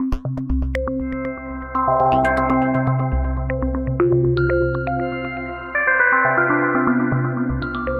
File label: NRG Arp, trance